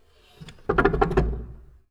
Music Stand, Piano; Piano Miscellaneous

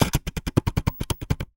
Camera Sequence

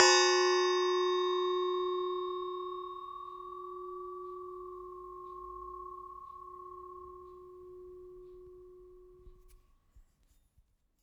Metal Teapot Stand Strike

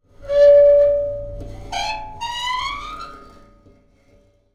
Pane Of Glass Rub

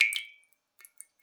Drips

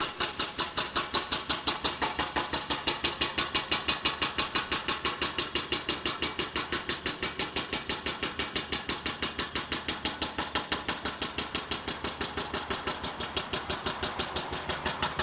Pneumatic Squasher; Sound Scapes

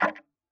Tick, Clocks Kitchen Clock

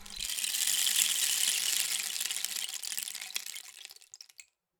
Rain Stick, Percussion Samples

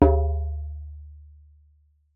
Jembe; Percussion Samples

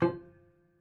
Prepared Piano

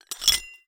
Hole Saw Rattle, Hole Saw Bits

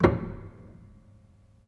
Hamm Perc, Piano Hammer Perc; Piano Miscellaneous

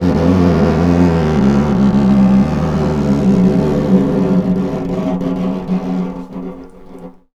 Snare Drum; Percussion Samples

